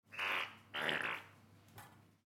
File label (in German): Majonese aus Flasche druecken